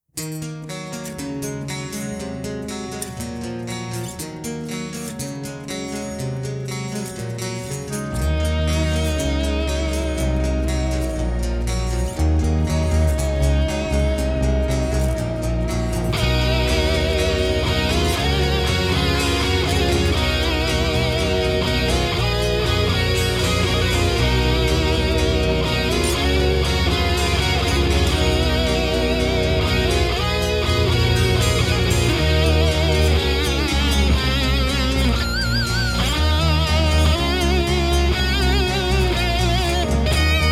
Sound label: instrumental